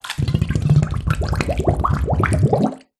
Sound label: household water submerge object in bathtub bubbles small room reverb